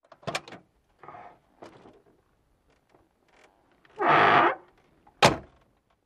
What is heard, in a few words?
Car Door Oc Large Door